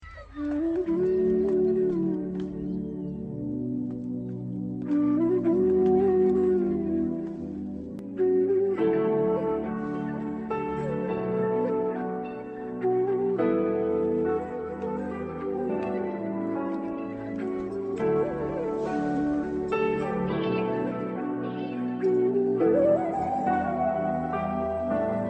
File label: heart touching flute ringtone; galaxy